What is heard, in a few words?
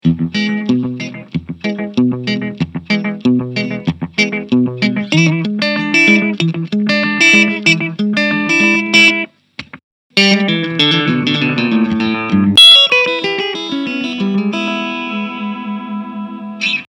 slapback